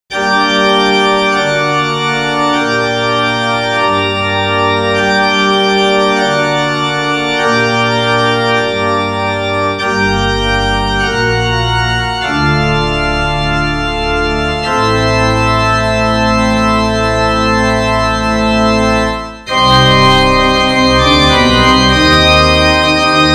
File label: Organ; Secret of Monkey Island